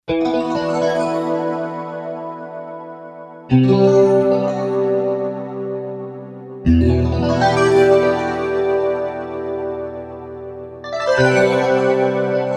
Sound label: Feedback JC, Roland SC-880; Factory Patches